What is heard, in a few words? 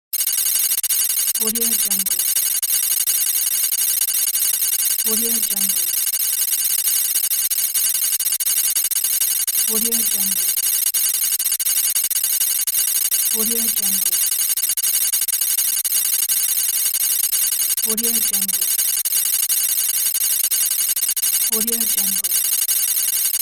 computer telemetry readout; futuristic sounds